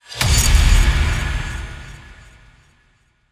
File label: Start Stinger; Void Bastards